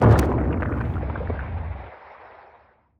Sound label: explosion, Hammerhead matriarch